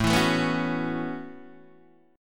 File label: A 6th Add 9th A down Guitar DADGAD